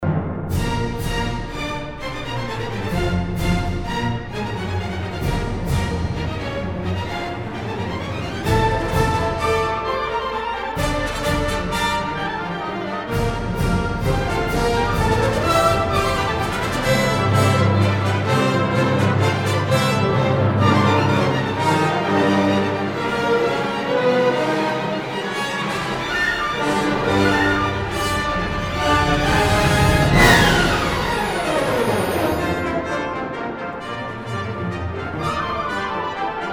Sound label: Symphony No. 7 in E minor; Gustav Mahler